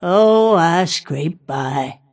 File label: last hit pain; snapfire